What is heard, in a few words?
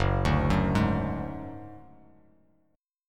F sharp-Suspended 2nd Sharp 5th-F sharp, Bass Standard, F#sus2#5 chord; arpeggiated; JGuitar